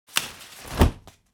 Rustle of umbrella being opened click mechanism

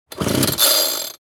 Chainsaw starting failed attempt

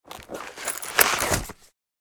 Purse putting item in

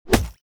Cartoon body punch smack

